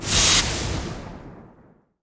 Bottle Lightning; Idle Wizard

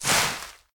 Environment Bush

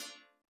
Ranking SFX; Blood Bros.; arcade

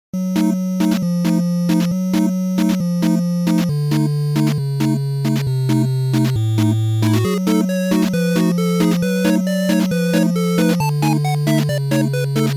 8-bit; Lenna's Inception; Financial Spectre

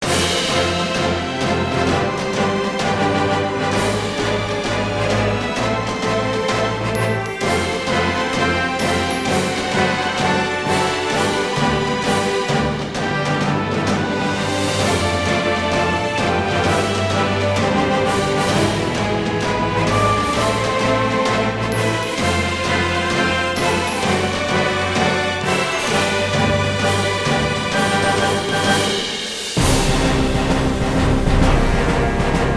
bgm sea battle comical boss

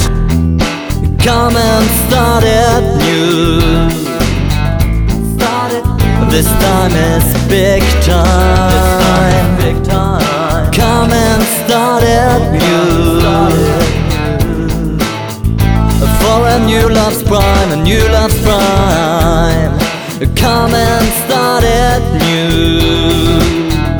Guitar tape

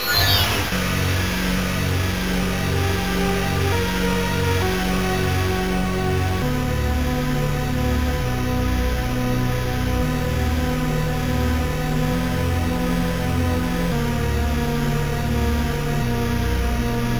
Colony Ambient; Perihelion The Prophecy; Amiga

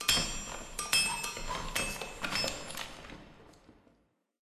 Minery work; Level3

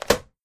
hit land sound

